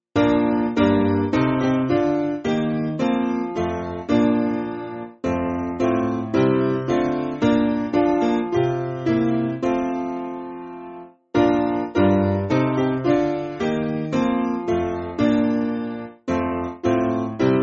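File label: Piano, Music Backing Files for Churches; We All Believe In God; Wir Glauben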